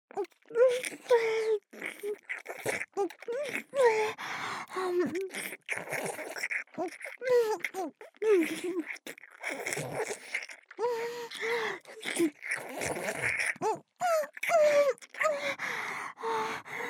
フェラ

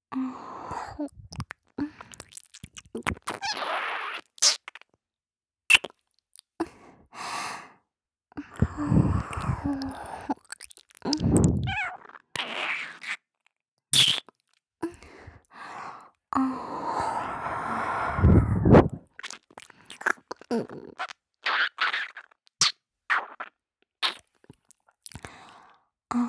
ちゅうぅ～っ…, 左耳, ASMR

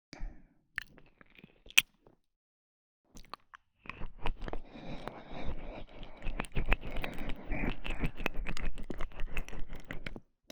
脳内ペロペロ

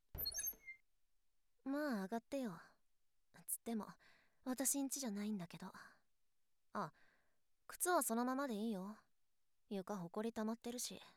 廃屋でキスと腋嗅ぎ 効果音入り

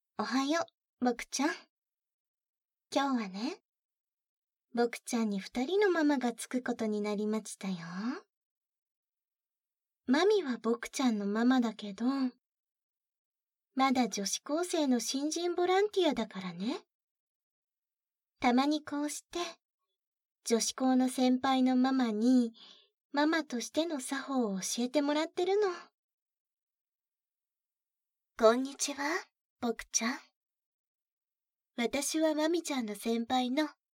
JKママ二人のサンドイッチ耳舐め, ASMR

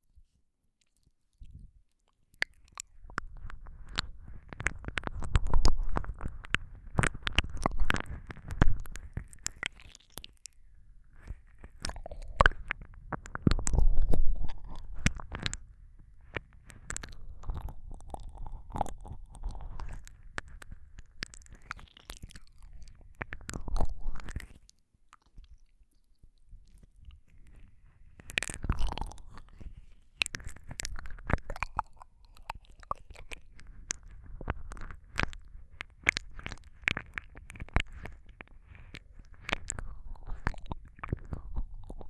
静かな耳舐め, ASMR

